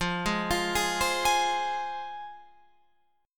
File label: F Minor F down Guitar Open F; arpeggiated; JGuitar